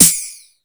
MONDO PERC, METALIC DRM

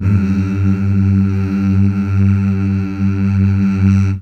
Mmh Male, CH BACK

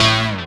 DR. LOOP; X-Static Goldmine 3; CHORDS

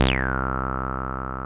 303 SAWTOOTH; CMP - Analogon

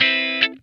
TIGHT, Funk Guitar; Zero G - Funk Guitar

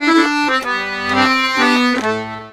Accordions; USB Soundscan vol.40; AKAI

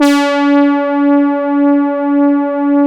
SYN ANA BRS, Analog; Sound & Vision; Gigapack I CD 2

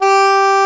SAX G3, SYNTHESIZER LEAD

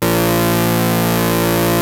ARP 2600 F2, synthesizer; Hollywood Edge - Giorgio Moroder Rare Synthesizer Collection

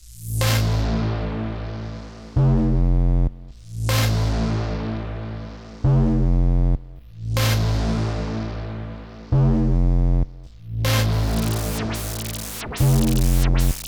Hardcore Breakbeat, 138 Amin; Big Fish Audio; Hardcore Breakbeat Action; E-thereal

